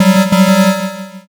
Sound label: wrong